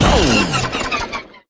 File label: depower